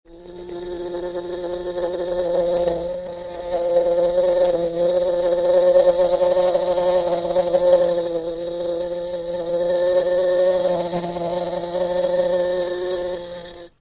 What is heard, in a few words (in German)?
wespe summen